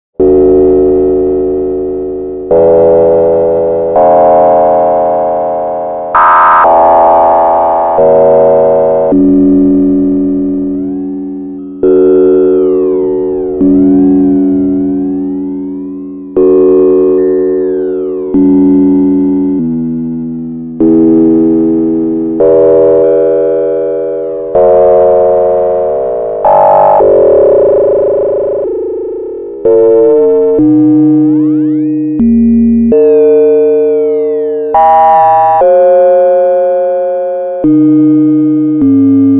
FM Bells

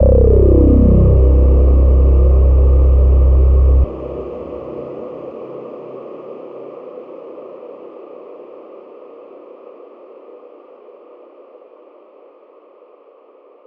Drone Hit Choral

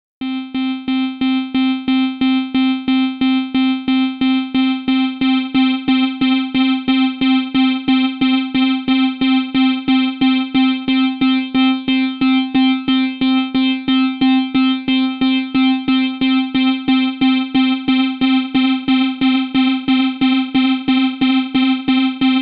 Chorus Controls

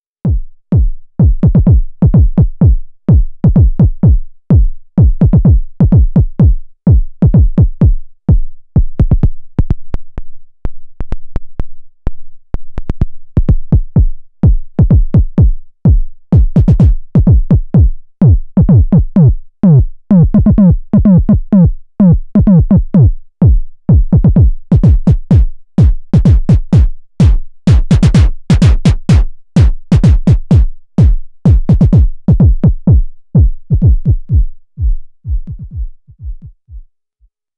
Kick

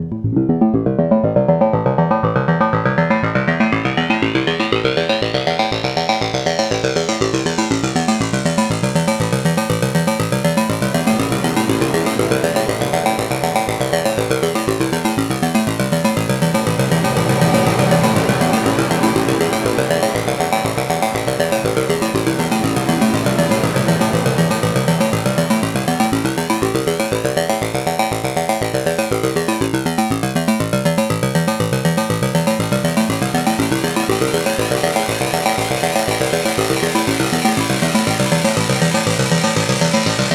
Saw Processed

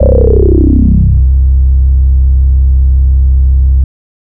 Drone Hit Dry

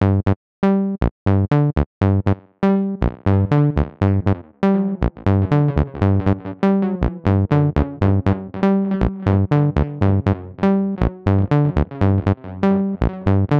Roland TB-03 tape delay

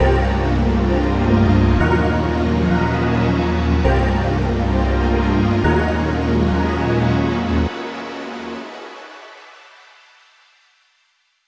Synth Chords Shimmer

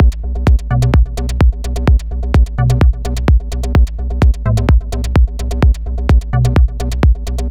techno 2pole; Techno Tutorial

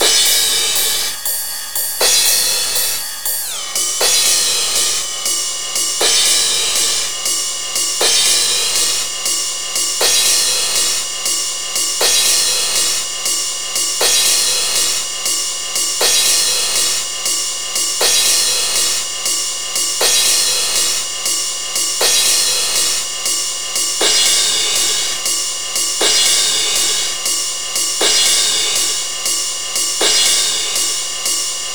Crash Ride

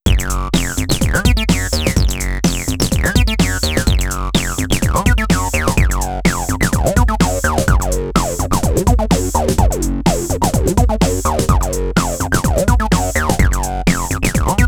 Acid Pattern

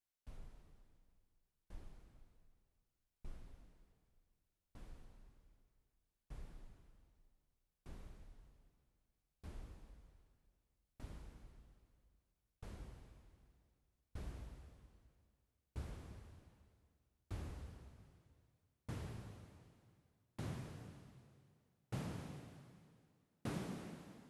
Korg Volcadrum LPF Noise

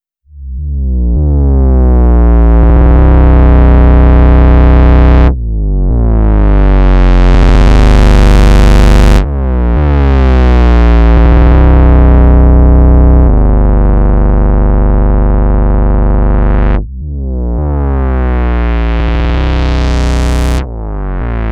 Behringer System 100 Pitch Envelope Bass

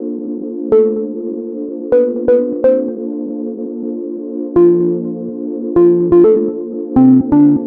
Synth dry

